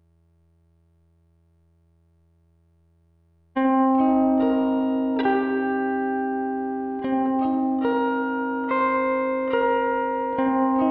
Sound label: gtr texture